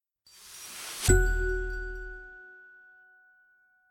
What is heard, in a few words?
desktop logoff; Yaru; gtk-common-themes